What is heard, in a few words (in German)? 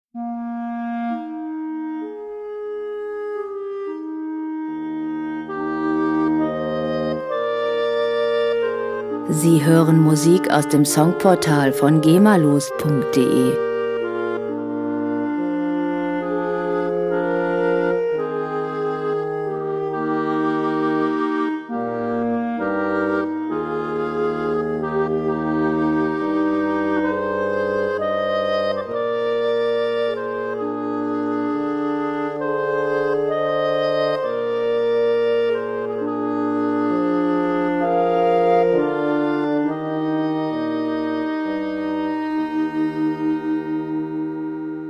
innsbrucker klarinetten jodler